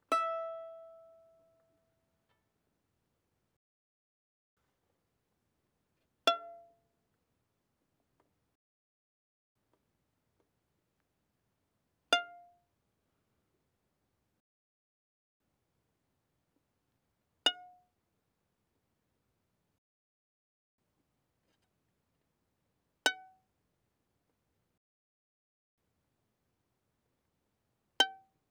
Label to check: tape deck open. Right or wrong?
Wrong